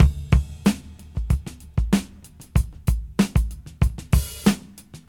94 bpm drum beat d key, Free breakbeat sample - kick tuned to the D note. Loudest frequency: 905Hz